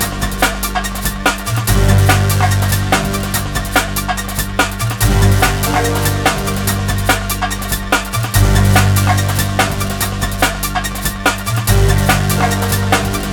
boss loop 144bpm

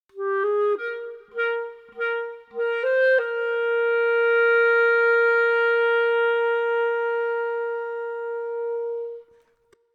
duet clarinet head 100bpm Eb Major; chicory; chicory a colorful tale; ps4

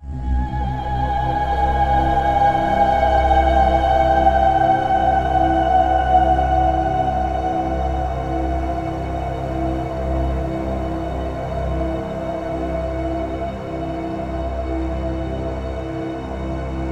Mystic Choir Sweep; Trials of the Blood Dragon

